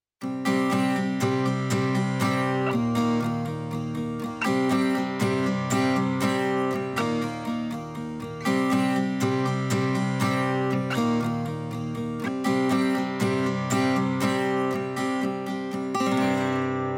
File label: Acoustic strummed Softube Console MK3